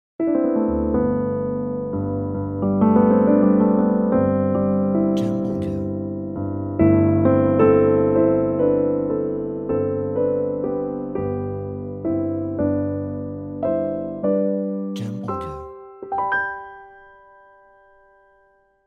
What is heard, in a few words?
Romantic Piano Intro